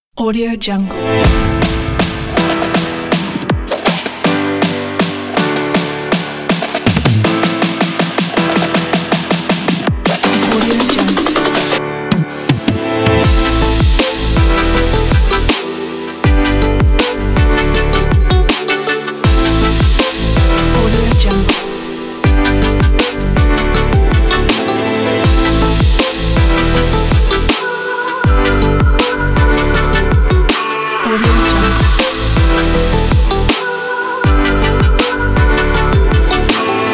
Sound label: future bass